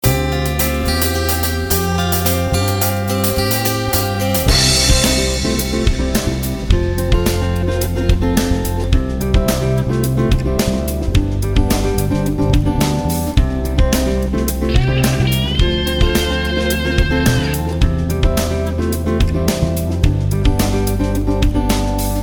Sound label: Groove Rock; Cues for Film and TV